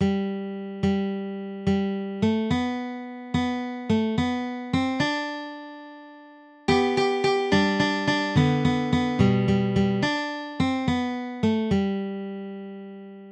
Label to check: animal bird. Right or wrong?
Wrong